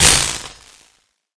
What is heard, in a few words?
electricity; smite; chaac